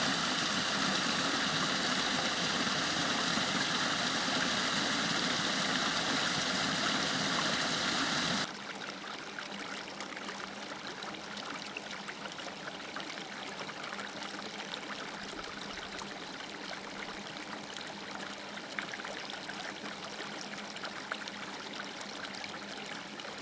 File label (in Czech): potok